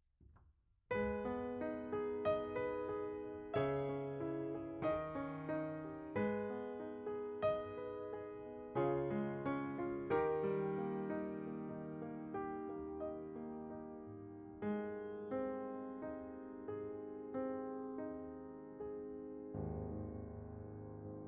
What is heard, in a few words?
Piano B